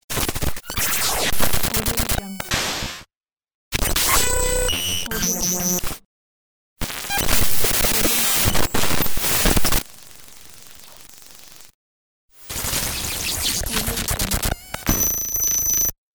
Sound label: glitch